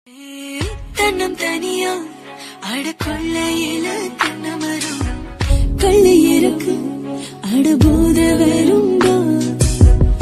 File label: Tamil Love Bgm Ringtone South Bgm